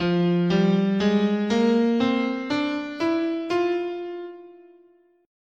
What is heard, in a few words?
F Melodic Minor, scale